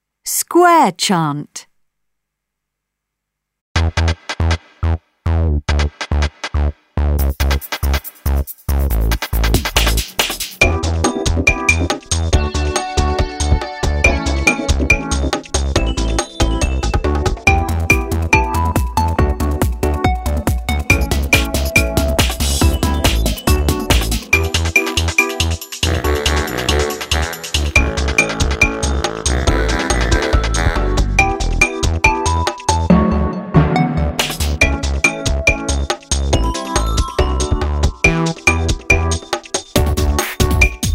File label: square chant